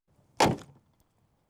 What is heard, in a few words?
Landrover Safari Diesel Door; Farm Machinery; BBC Sound Effects Library